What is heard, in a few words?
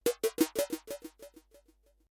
space trash; Kenney's Sound Pack; Digital Audio